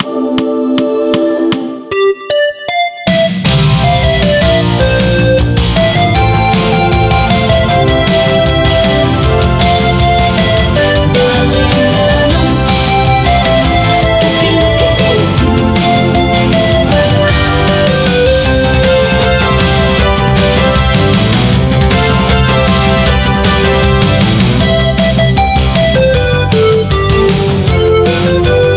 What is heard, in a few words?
BGM Reach